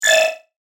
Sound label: Multimedia Notify, Technology, Multimedia, Notification, Element; Alerts